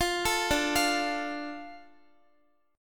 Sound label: D Minor D Guitar; arpeggiated; x,x,15,14,14,12; 4E,4A,5D,5G,5C,6F